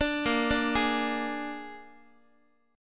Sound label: Bb 7th Bb down Guitar 4A,4E,5A,5D,5G sharp,6C sharp; arpeggiated; JGuitar